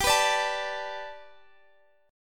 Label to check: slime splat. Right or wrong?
Wrong